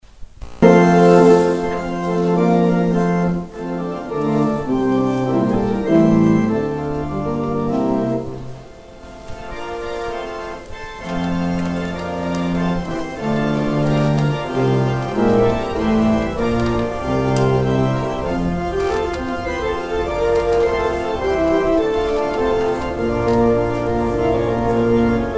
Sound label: organ outro; exit music